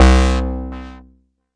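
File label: mbrute synth, Arturia MiniBrute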